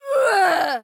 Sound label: female death